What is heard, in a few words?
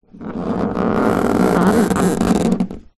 wood squeak polished floor boards hand; foley